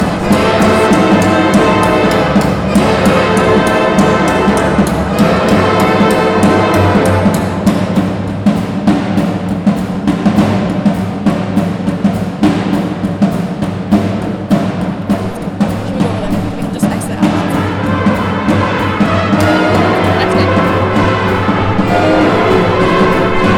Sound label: Band performing